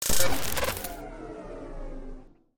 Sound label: Lung Machine Disconnect; Lethal Company